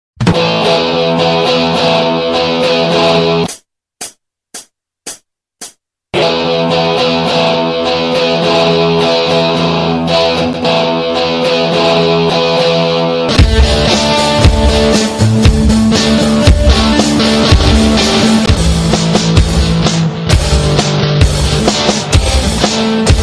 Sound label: Music in Drums